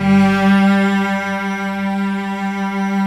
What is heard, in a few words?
CURT CELL; AKAI S-Series CD-ROM Sound Library VOL-7; CELLO+VIOLIN